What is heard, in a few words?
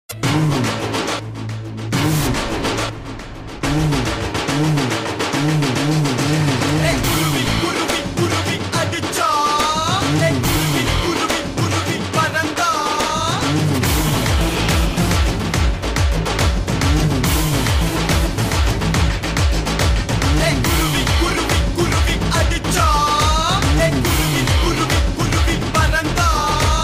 kuthu bgm; kuruvi